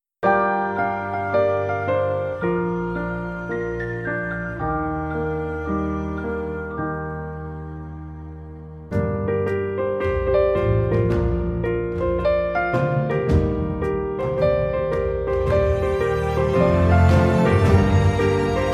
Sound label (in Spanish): Música de suelo, Piano Instrumental